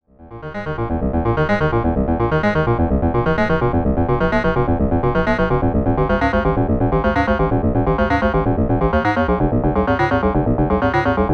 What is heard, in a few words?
Sonicware ELZ 1 FM Synth Envelope to Ratio Modulation